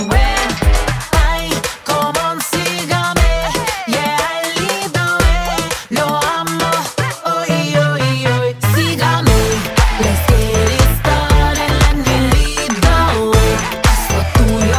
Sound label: Latin Pop